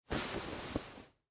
Wool Coat Unfold, Foley, Clothes, Wool, Coat, Unfold, Over, Arm; Dressing; BlastWave